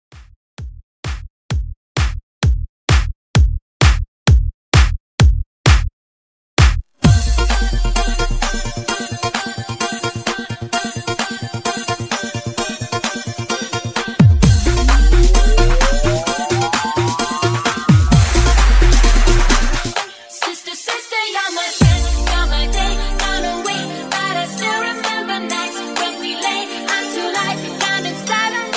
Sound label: Redrum